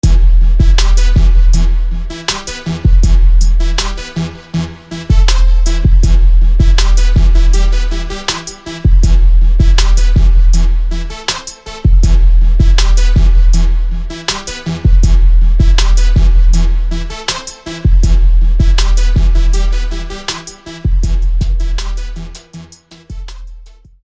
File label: Brass Hit; DSouth Brass Hit